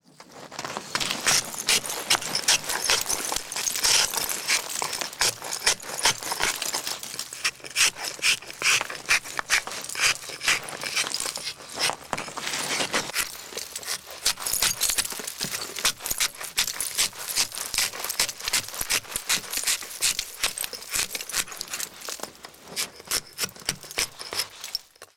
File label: Ice Carving